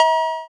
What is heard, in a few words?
cowbell, drift phonk